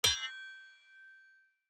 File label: Sword Clang; soundscrate